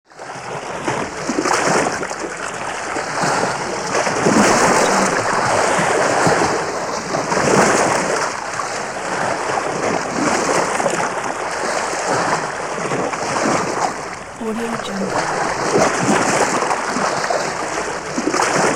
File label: large water slurps and laps